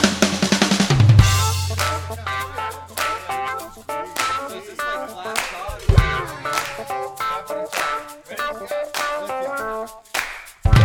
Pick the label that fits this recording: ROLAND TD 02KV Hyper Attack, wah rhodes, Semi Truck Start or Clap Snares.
wah rhodes